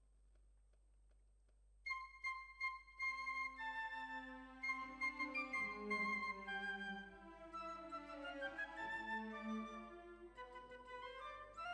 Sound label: Violins, jazz for piano